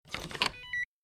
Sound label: Swipe Key Card Alarm; hi-tech-various